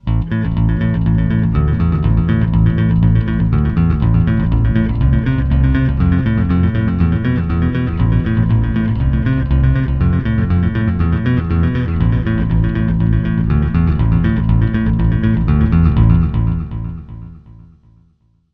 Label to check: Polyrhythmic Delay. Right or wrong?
Right